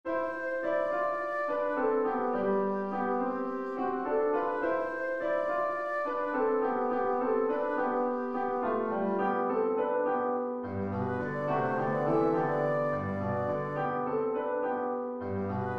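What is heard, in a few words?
rickshaw rhythm; lewis alastair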